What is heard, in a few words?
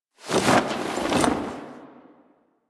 Camp Canopy Flap